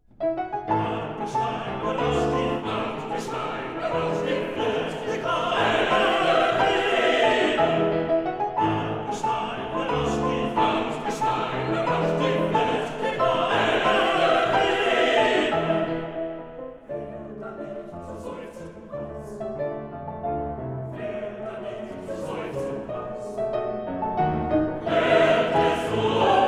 Waltzes for vocal quartet & piano, 4 hands; Liebeslieder Waltzes (18); Am Gesteine rauscht d; Brahms

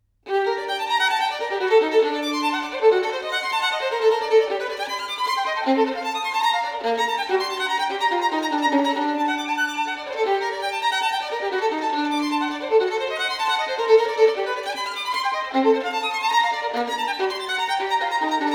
Caprices for solo violin, G Minor; Capriccio No. 16; Paganini; Caprices (24)

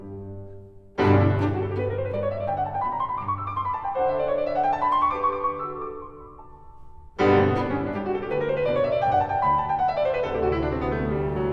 Variations for cello & piano in G major; on Handel's ＂See, the Conqu'ring Hero comes,＂ WoO 4; Beethoven

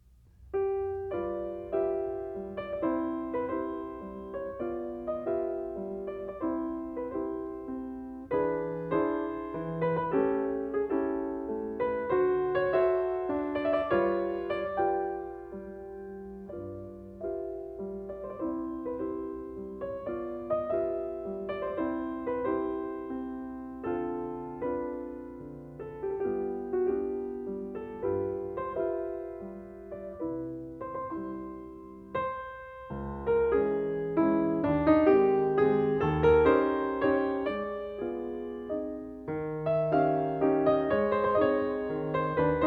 Mazurkas for piano in C; Chopin

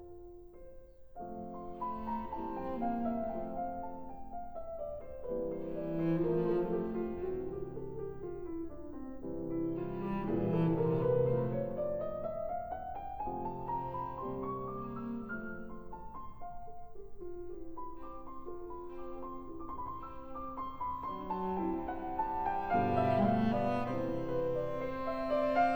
Variations for cello & piano in F major

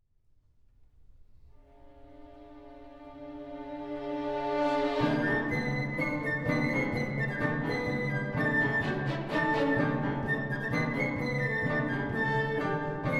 Etudes for orchestra, Danse; Stravinsky; Four Studies

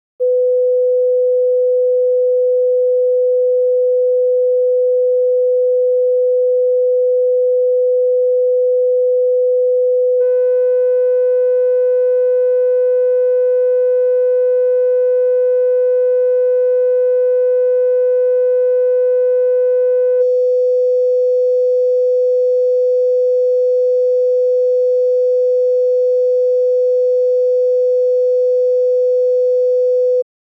Typical amplifier distortion signatures (L+R); Stereophile Test CD, Vol 2

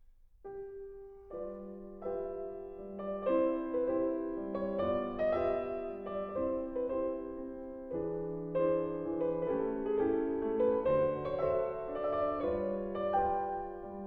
Mazurkas for piano, C major, Semplice

